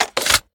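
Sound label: sniper reload empty bolt pull; gmod; iw8; xmike109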